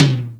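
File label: low tom; MPC-60 DRUM2; Greytsounds VOL-1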